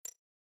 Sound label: click